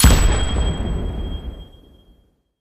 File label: multiplier Final; jungle spirit